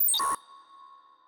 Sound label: Future Sounds, Interface Futuristic; ArmyRaid